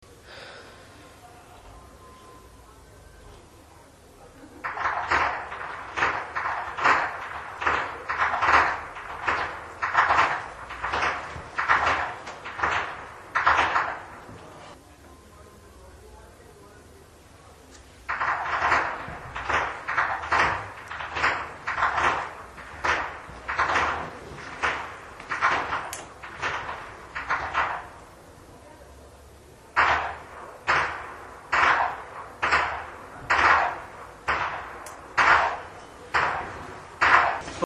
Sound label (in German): schnalzen, flachgau; anthering